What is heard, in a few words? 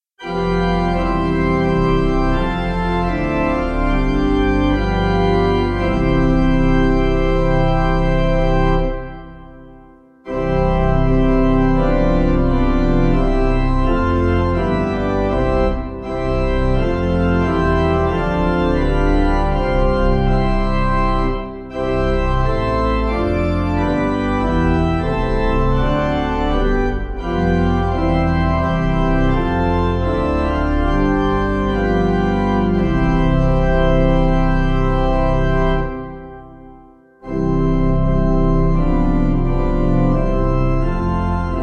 Pipe